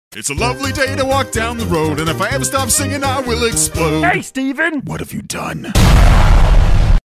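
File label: if i ever stop singing i will explode; asdf movie; minecraft animation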